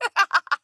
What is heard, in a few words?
Laugh; Quests; Fable Of Garren Rood; Deora